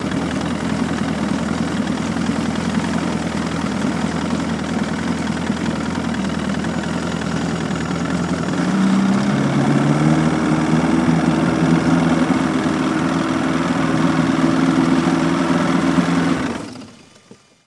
115 hp Evinrude Boat Pull Up Shut Off, On Board Mono